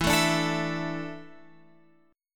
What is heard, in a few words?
E 7th Suspended 4th, Guitar, Open F; E7sus4; strummed